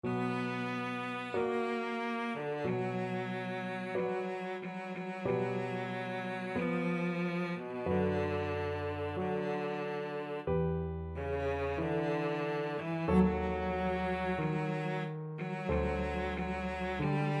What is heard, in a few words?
vesti la giubba, cello